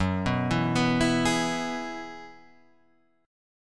F sharp 9th Suspended 4th F sharp Guitar Half Step Down